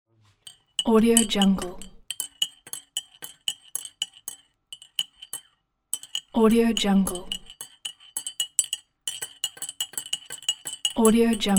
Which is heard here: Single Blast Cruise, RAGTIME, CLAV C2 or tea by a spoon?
tea by a spoon